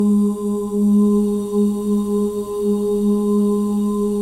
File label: BGV OOS; Spectrasonics; Symphony of Voices